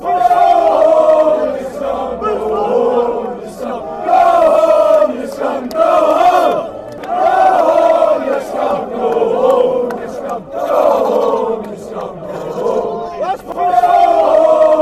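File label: go home dunfermline, football chant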